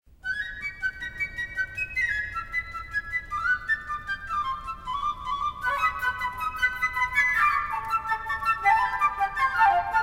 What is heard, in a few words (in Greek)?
Συμφωνική Ορχήστρα Η φούγκα